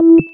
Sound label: Alert; Motorola Droid Razr M